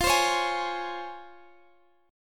Db Major 7th

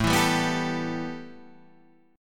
A 7th Suspended 2nd, A, down

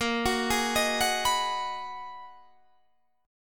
Ab Minor 7th; Abm7/Bb; arpeggiated

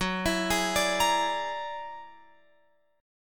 F sharp Augmented 9th, Guitar, Open F; arpeggiated; JGuitar

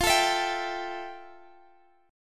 F Major 9th, chord; FM9; x,20,19,21,20,x; strummed

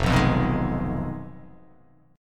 F Minor 7th Flat 5th F down

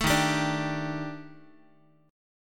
Eb Major 7th Flat 5th, Guitar; EbM7b5; strummed

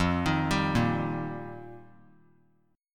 F 9th Suspended 4th F, chord; arpeggiated; JGuitar

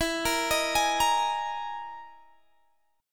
E Major 7th Flat 5th, Guitar, Standard; EM7b5 arpeggiated; x,19,20,20,21,18; JGuitar

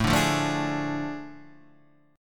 A Major 7th Suspended 4th Sharp 5th; AM7sus4#5 Chord {5 5 3 2 3 4} strummed

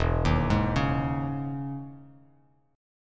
E Major 13th down Bass Standard

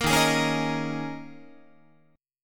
D sharp 7th Suspended 4th, Guitar; D#7sus4; strummed

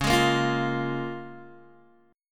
Db 6th, Guitar, Open G

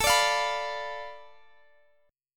F 6th Flat 5th A, chord

